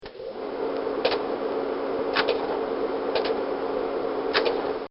Projector Slide; Offices; Bobby Cole

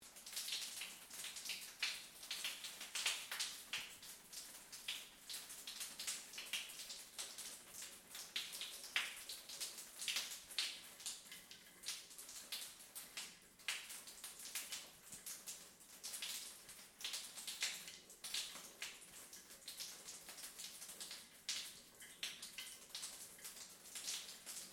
Water Drips Shower, Large, Tile, Loop; Water Sound Effects; Nature; BlastWave

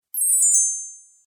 Magical Turning Spell

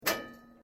metal rods hit; Metal (Sound Effects:Materials)

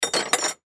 Tool Set Down Metal; Hand Tools; INDUSTRY

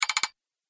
Metal Tapping

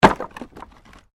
Wood Movement Medium

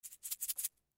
Beads Shake Small, Beads Shake; Beads Shake Art Craft; Shaking Sound Effects; Objects; Foley

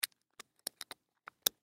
Mouse Trap Set; Household; Machines; Blastwave

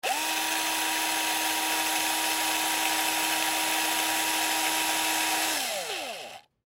Power Drill Rev, Power Drill Rev Hard; Drills; Tools; Industry

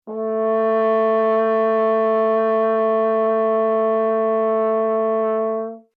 Horn A4 F Long

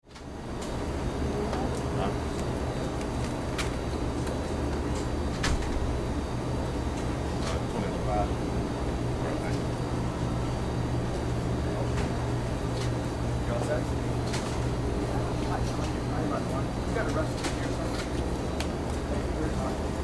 Ambience Convenient Store; Stores And Mall; Backgrounds

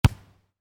Volleyball (Exterior): Hit Medium; Miscellaneous Sports; Stuart Duffield

